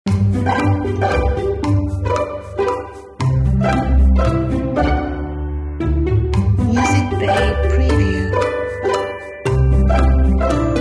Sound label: Commercial Background